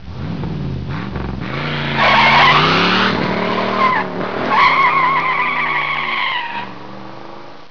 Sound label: DRV OFF